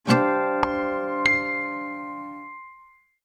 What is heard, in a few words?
Harmonics, notifications; Sony; C1905 Xperia M